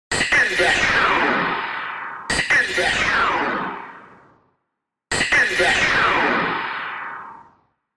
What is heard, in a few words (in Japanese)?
ディレイ